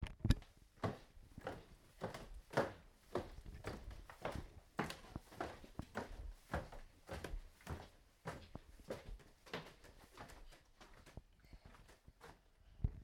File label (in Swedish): Steg Med Tofflor I Trappa